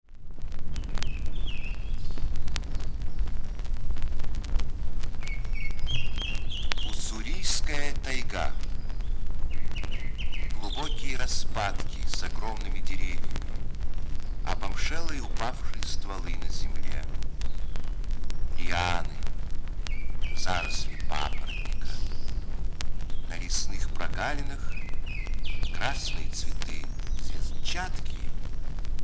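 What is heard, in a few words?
bird voices in nature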